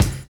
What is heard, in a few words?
SERIAL BD; Univers Sons